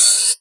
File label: OPEN HAT